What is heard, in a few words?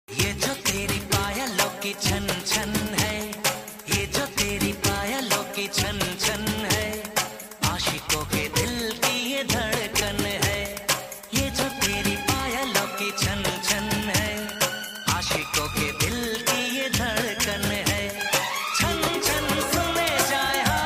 Old Hindi Song Ringtone